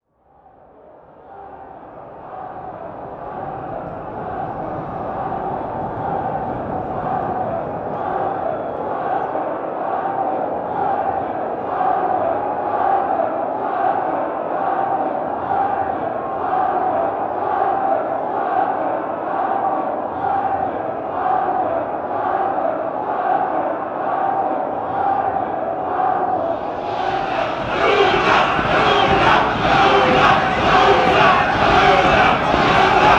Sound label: walla post; coup; Call of Duty Modern Warfare